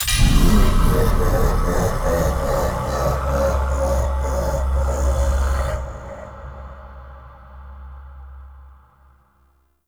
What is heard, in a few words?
Tablet Wrong; Warframe; Duviri; Easter Eggs